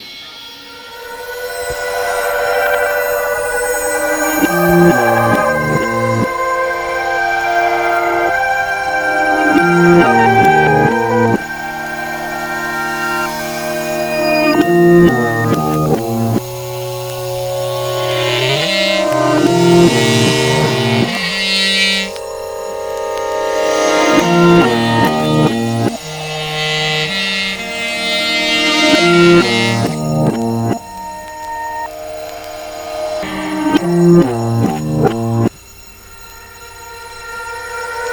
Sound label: explosions